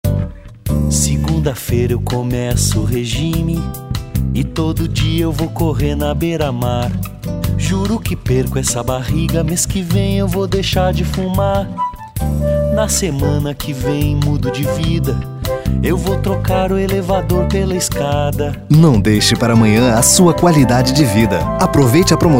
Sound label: Jingle; Unimed